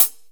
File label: HAT; Ensoniq ZR-76